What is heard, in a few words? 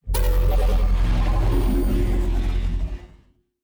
psionic robot; Stellaris; shroud